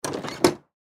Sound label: Door Exterior Open, Door Exterior Open Squeak Office; Factory Office; INDUSTRY; Miscellaneous Doors; Blastwave